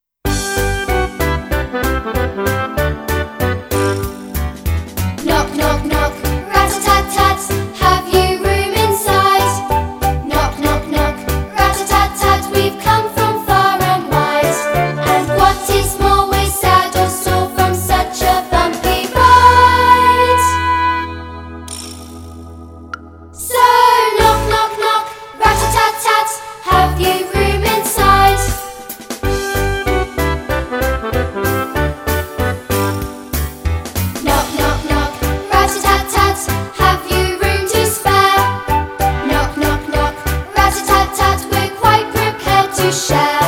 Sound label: with vocals